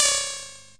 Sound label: mosquito death, electro FX